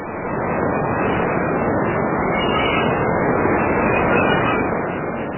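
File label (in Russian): прибой шумный птица буревестник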